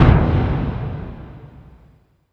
IMPLODE, PERC; NorthStar - The Wizard VOL-2; TSAR PERC

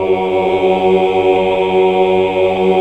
OOH, Hallelujah

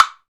PRC WOOD BL, Roland

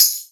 Tambourine, Alesis HR16B drum machine; 300 Drum Machines

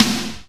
80s Snare; Drums

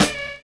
SLOW; Univers Sons

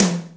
TOM; Best Service Gigapack 2

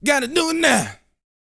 VOCAL, R&B Dance, HIP-HOP

